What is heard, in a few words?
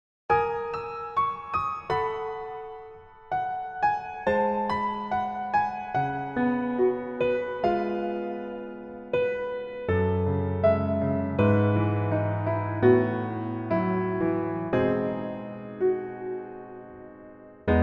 Piano MR